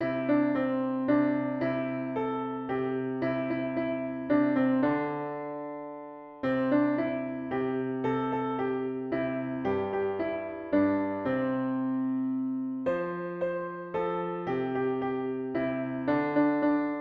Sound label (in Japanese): ピアノ